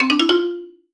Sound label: Solo Xylophone Positive; MUSIC EFFECT; startide